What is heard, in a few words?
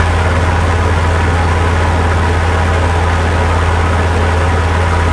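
bomber cockpit left, engines; War Thunder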